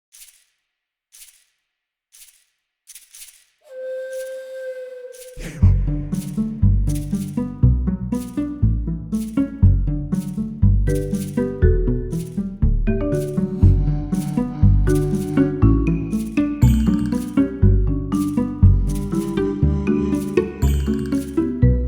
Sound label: Vocal-Drop Mix; Pony Music Archive